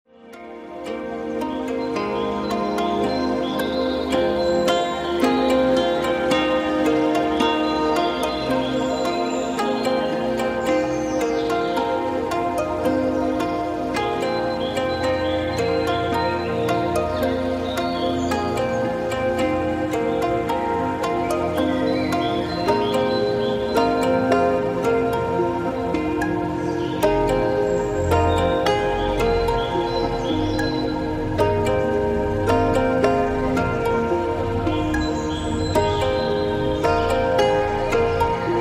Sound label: Japanese Zen